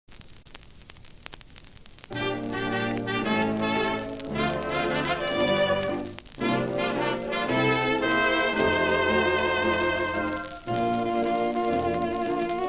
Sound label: instrumental, Jazz; Popular Jazz Archive 1920-1936